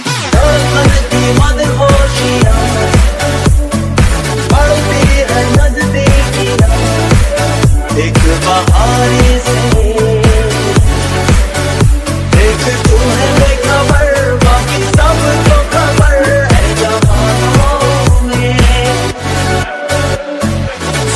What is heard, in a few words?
latest bollywood ringtone; whatsapp; bollywood ringtones